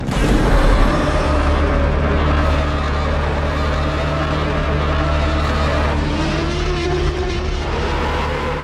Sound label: roar; godzilla evolved